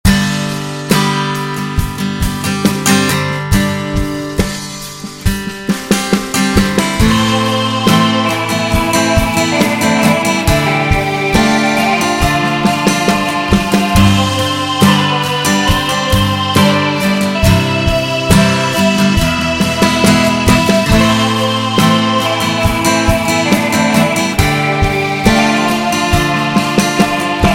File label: Instrumental